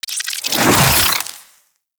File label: Warfan Charge Launch, Weapons Melee; Warframe